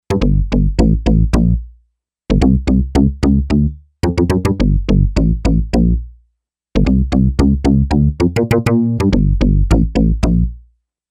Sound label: Synth BASS